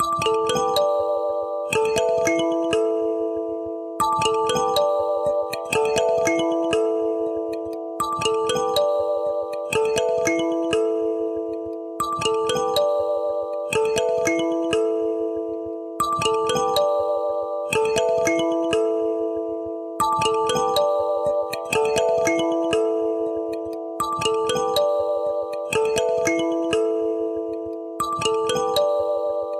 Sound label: Underscore Thumb Pianos